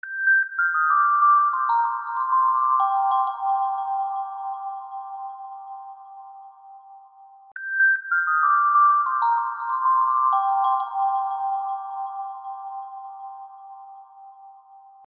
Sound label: Carol of the Bells Ringtone; Vivo